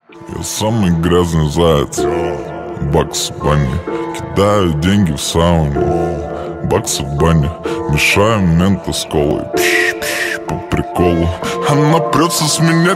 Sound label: slowed remix